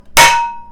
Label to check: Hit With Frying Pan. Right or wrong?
Right